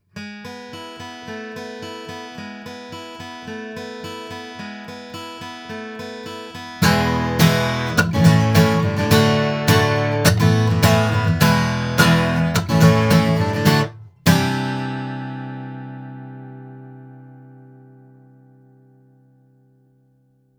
guitar; CT Soundstage Image; microphones; Crowley Tripp

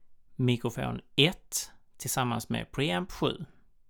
mic pre

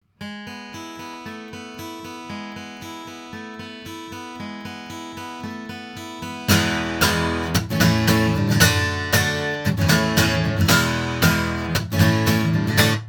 sE R-1 guitar; se electronics

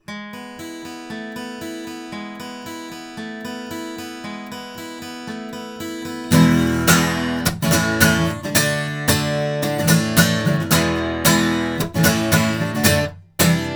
Behringer ECM8000 guitar, microphones recordings

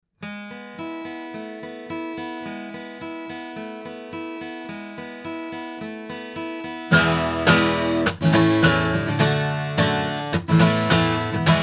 Sontronics Omega guitar, microphones recordings

